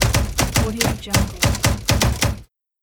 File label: a typewriter